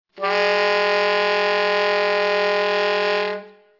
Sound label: multi, sax